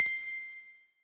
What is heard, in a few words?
level up; DoubleTigersWD; wazdan